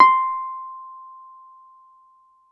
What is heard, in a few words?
Piano Wurl c5 hard; TrashLibrary